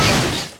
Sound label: crush, Impact